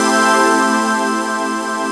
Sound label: HOUCEY CHORD; AKAI S6000 CD-ROM - Volume 1; SYNTHESIZER